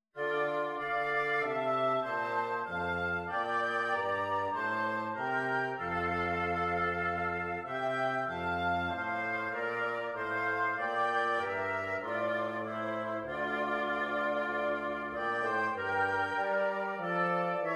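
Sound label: O Come, O Come, Emmanuel flute oboe bassoon; Christmas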